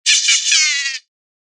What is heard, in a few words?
evil laughter real